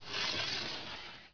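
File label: slide over; hockey95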